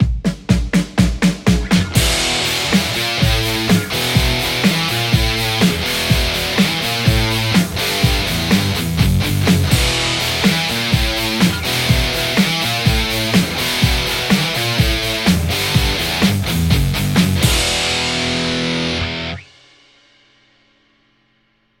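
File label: Telecaster; poulin le456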